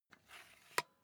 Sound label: air pump small in